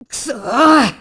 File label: death